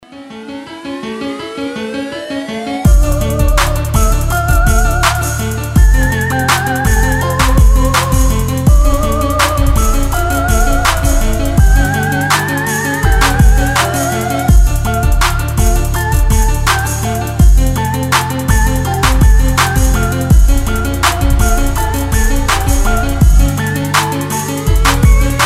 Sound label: hip hop remix